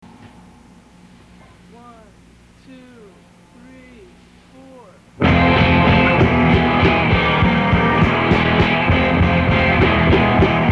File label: Instrumental